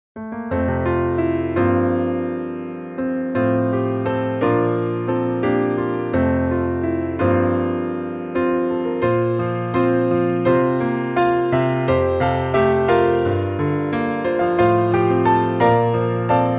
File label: Piano full